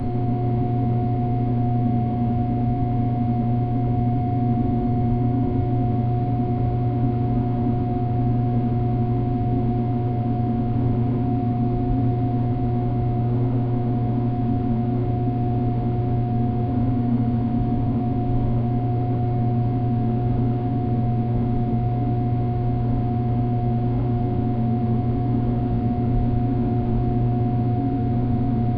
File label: industrial underground, humming